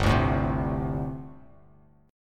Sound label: G Suspended 2nd Suspended 4th, Bass Standard; strummed; 3,5,7,5